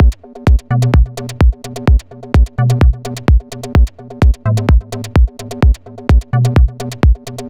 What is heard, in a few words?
techno 2pole; Techno Tutorial